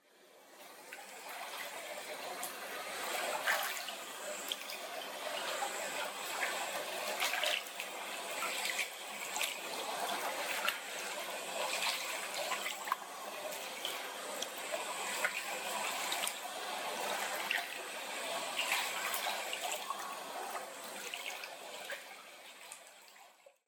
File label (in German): Whirlpoolbad; Geraeusche